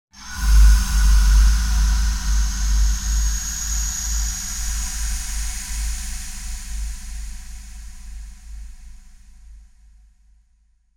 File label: Impact Airy Scary Airy High Hissing Drone